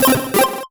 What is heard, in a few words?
Solo Chip Arp Positive